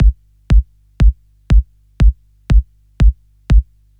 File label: groove kicks